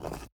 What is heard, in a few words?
ui pickup stone; Rust